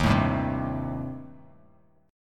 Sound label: Eb 6th Flat 5th Eb, Bass Standard; Eb6b5 strummed; 11,12,5,5; JGuitar